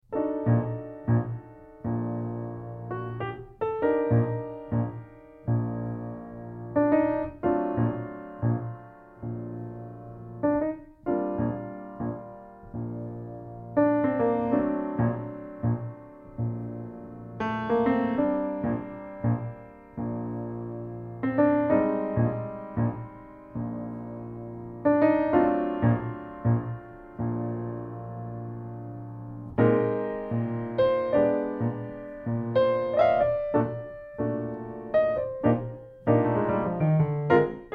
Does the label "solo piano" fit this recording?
Yes